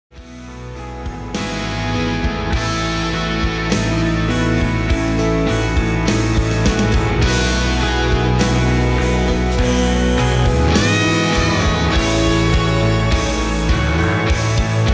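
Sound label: rock instrumental sample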